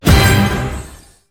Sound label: Mega Win; Hot Spin; CQ9